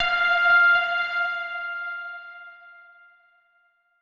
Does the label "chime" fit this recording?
Yes